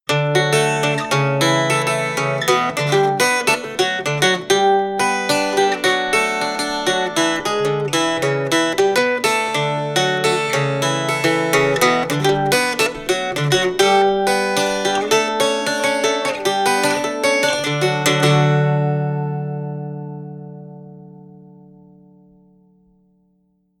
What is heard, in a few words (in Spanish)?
cuatro de Puerto Rico